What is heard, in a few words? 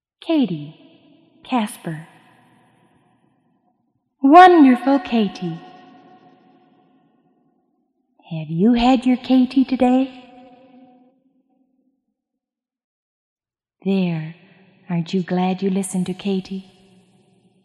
Accapella